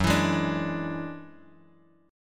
F sharp 7th Suspended 2nd Sharp 5th, Bass Standard; strummed; JGuitar